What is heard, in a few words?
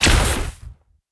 Weapon Shoot